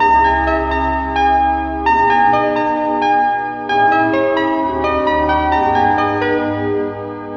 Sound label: piano and keys, Sad; loops